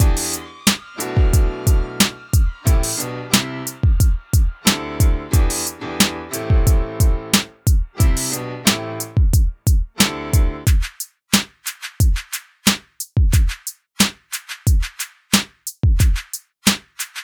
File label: Delicate and Airy